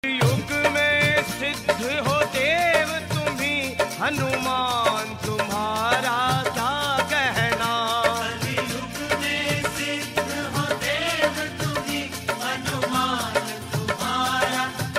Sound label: Hanuman Ji Bhajan